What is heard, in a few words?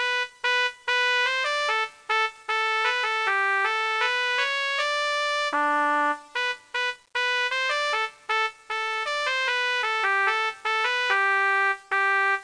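trumpet; My Science Fair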